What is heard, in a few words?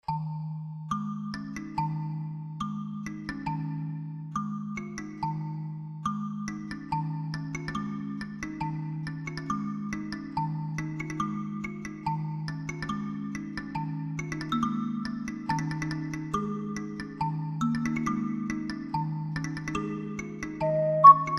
melody only